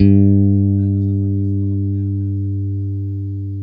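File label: Stratocaster, Guitar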